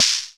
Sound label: snare mod; snares